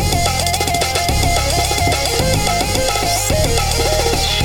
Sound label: loop